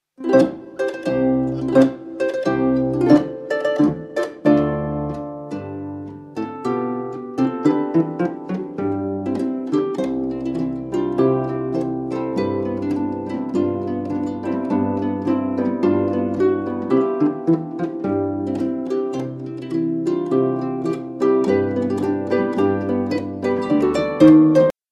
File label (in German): Dorfmusik Marsch